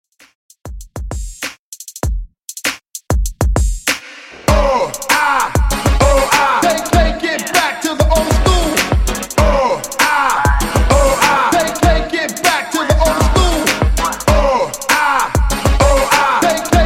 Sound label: RnB Old Skool Starter Redrum 98 Bpm